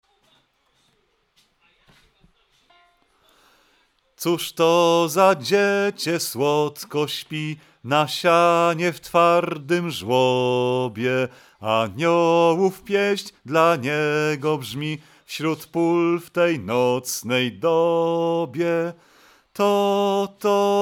Coz to za dziecie Bas; Boze Narodzenie

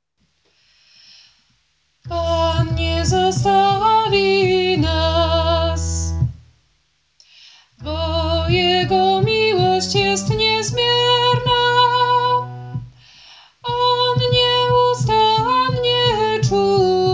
tenor; Panu zaufalem

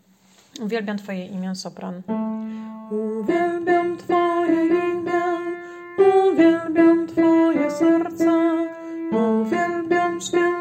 sopran; Wielki Post

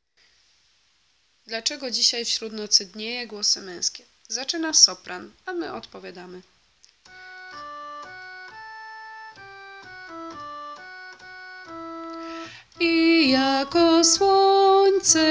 dlaczego dzisiaj glosy meskie; Boze Narodzenie

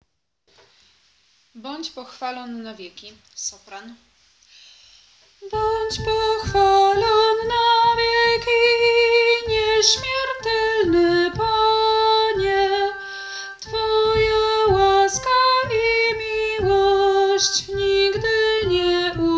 Sopran; Badz pochwalon na wieki

